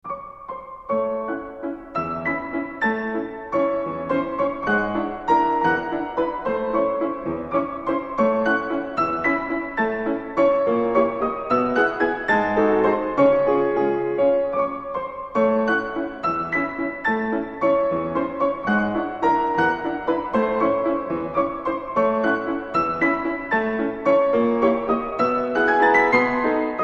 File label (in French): albumblatt in Walzerform pour piano; LISZT